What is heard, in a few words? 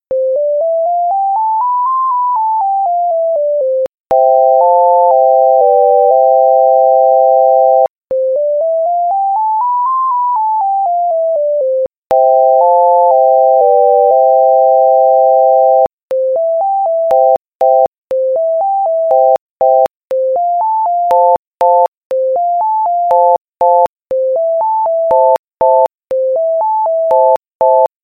equal temperament and just intonation